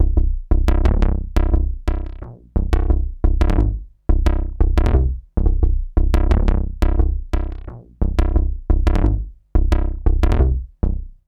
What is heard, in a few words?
BAZE, BASSLINES; Masterbits - Tekkno Trax